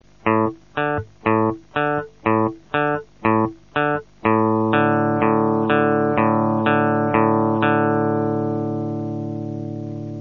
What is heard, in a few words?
ear tune